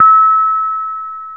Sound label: reyong hi, gamelan; gravityharps